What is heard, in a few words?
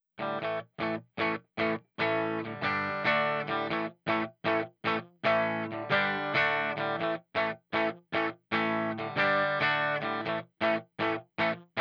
distortion without